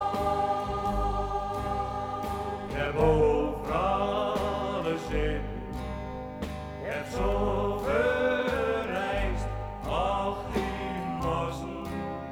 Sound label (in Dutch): Koor gemengd